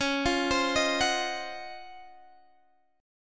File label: C sharp Major 11th Guitar Standard; C#M11 arpeggiated; 21,20,22,20,19,x; JGuitar